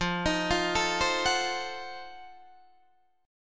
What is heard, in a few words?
F 7th Flat 9th, Guitar Open D